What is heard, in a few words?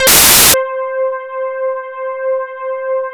T3 E-PIANO; KEYS; Alpine Volksmusik